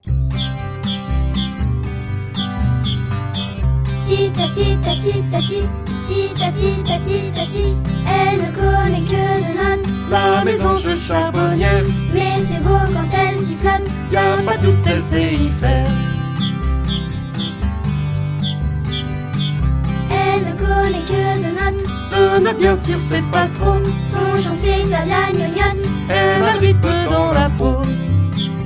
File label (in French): Chanson La mésange charbonnière